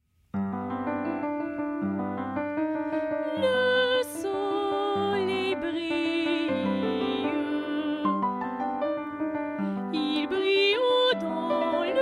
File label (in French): Chant Le soleil brille